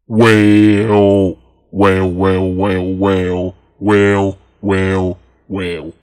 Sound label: whale